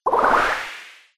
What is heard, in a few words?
drink snoball; There; ThereClient